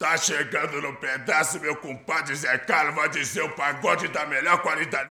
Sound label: BRAZIL, World Voices